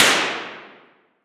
Weapon Gun Machine Gun 9mm Single Shot Interior Shooting Range; AdobeCC